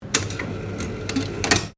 MACHINERY INDUSTRIAL TAG DISPENCER OPERATING STEREO; Commercial tag dispencer